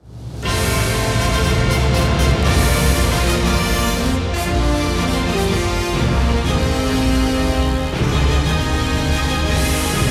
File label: Theme - Crescendo - No Choir; Wing Commander